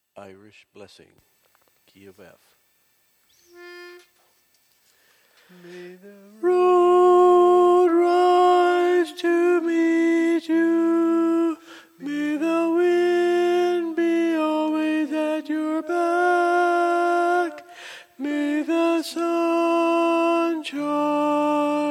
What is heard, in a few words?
Irish Blessing Tenor; church sing-outs